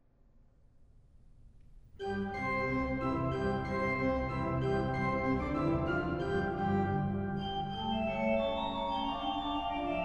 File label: obo